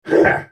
spell cast effort; Urgot; en US

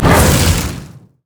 brutal strikes; LoL; glacial malphite

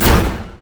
arcane barrage; LoL; ironforge xerath

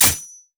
crit attack; LoL; akali

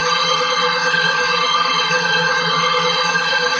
stair laser loop; odin

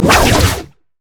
stacked deck; LoL; twistedfate

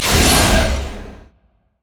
ally teleport; LoL; thresh

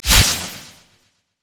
lightning rush; Kennen

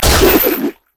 bola strike; LoL; hunter rengar

